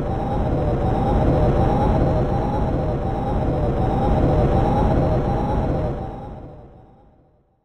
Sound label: wind; Wizardry; ebwizardry